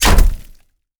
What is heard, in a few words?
hit, damage; DCS